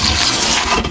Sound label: bradium golem stand; classicro